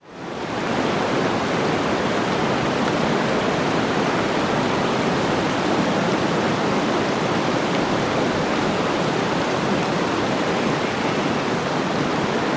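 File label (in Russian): zvuki meteli, blizzard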